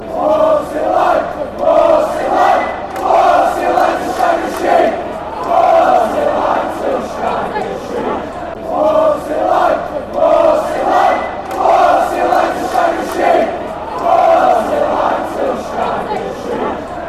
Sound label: whats it shag sheep, fan chants